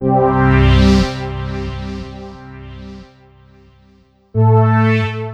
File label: Pad Stab; пэды